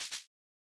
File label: open